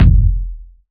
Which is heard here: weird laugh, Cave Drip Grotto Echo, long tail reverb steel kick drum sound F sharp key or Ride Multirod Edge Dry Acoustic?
long tail reverb steel kick drum sound F sharp key